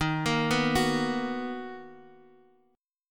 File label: B Major 7th Eb